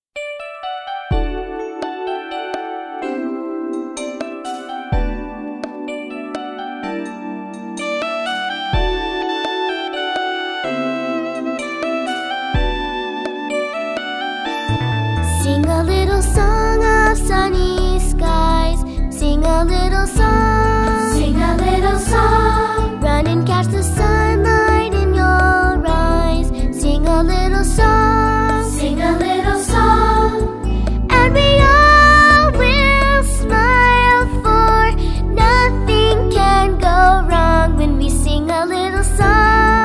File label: sing a little song vocal